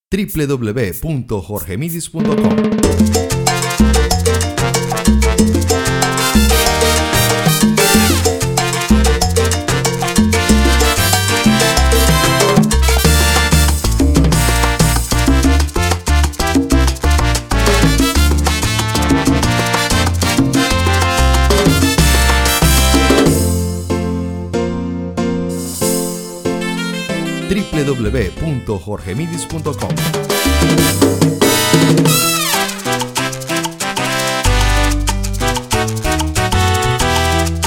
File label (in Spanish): salsa